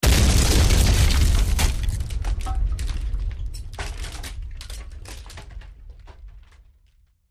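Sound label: Explosion Wood Debris; wood & sand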